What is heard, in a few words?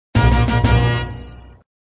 Music, flipper; sttng